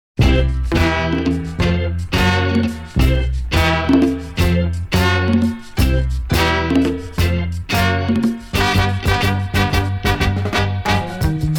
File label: Hot Reggae